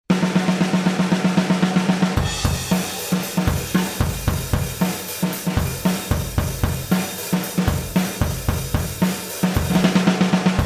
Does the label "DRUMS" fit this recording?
Yes